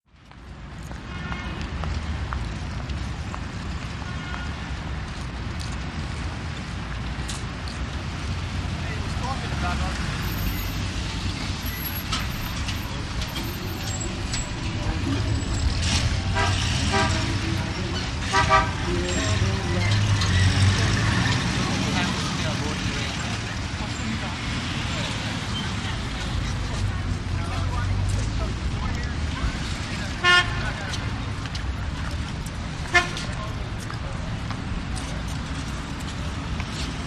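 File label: City Traffic Times Square; big city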